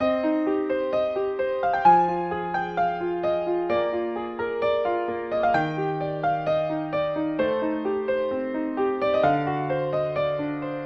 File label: Piano Ballad